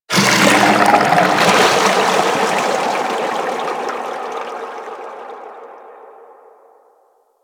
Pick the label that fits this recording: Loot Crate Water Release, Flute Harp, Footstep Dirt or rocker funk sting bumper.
Loot Crate Water Release